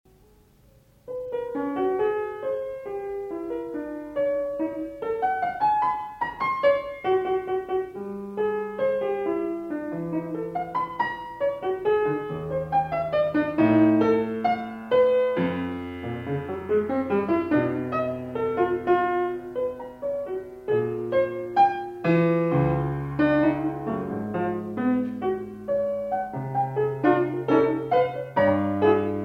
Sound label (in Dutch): Pianostuk